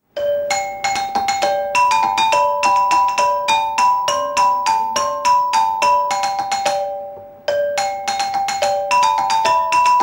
campanine; valzer; Alberto Carrara, di Albino; Albino 3-5-1991. Registrazione di Valter Biella

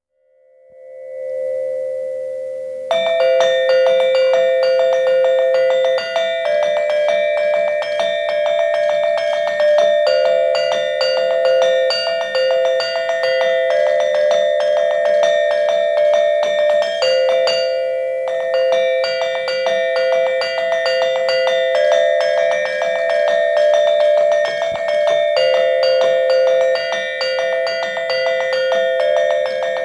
campane, Musica in 6/8 per tre campane, suonata come esempio sulle campanine; Leffe (Bergamo); Tarcisio Beltrami, di Leffe (Bergamo); Leffe, 2 - 2 - 198

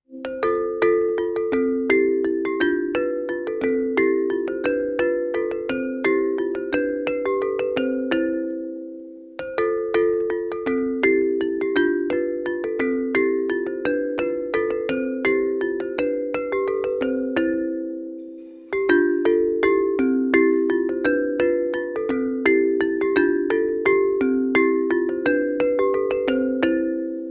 campanine; mazurca; Bergamo; Giampietro Migliorini, di Bergamo; appresa da Agostino Casari (1901 - 1978), campanaro di Martinengo; Bergamo, 9- 8- 1993. Registrazione di Valter Biella

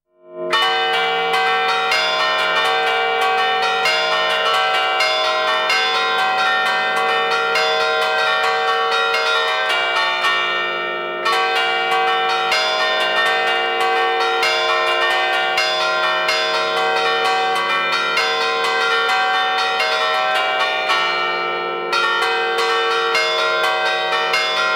campane, marcia per cinque campane della chiesa; Castagneta

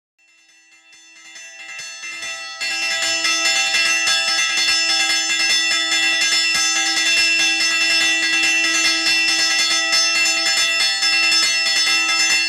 campane, Marcia veloce per una campana; Santuario di Drezzo; Drezzo (Como); Alessio Sala, di Drezzo (Como)

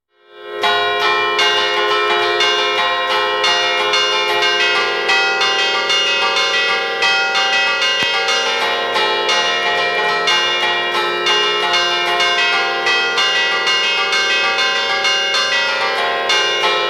campane, mazurca per cinque campane, chiesa; di Grumello de' Zanchi; Giulio Donadoni di Grumello de' Zanchi (frazione di Zogno, 1930 - 2011)

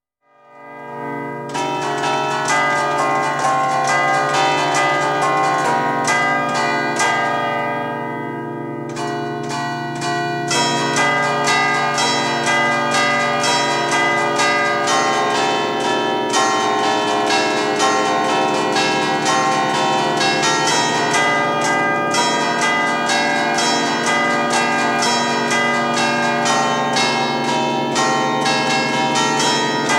campane, valzer per otto campane; Chiesa di Bagnatica

